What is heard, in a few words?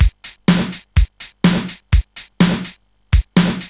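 rhythm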